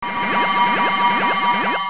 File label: Alarm, Ding Dong